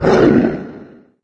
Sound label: mancubus pain; doom3